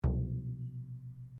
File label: Bodran, Irish styled flat drum; Drums; Musical